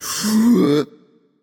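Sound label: nonverbal breath; voicepack; smite; merlin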